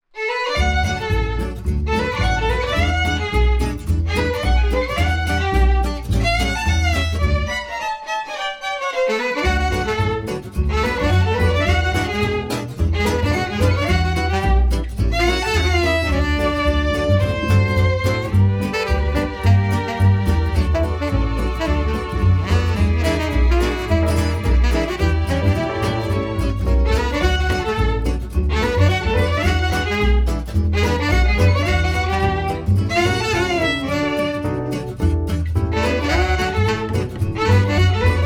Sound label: swing